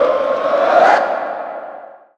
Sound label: crowd big ready; HeavenStudio; fan Club